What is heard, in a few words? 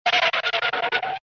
screech; disney; mashup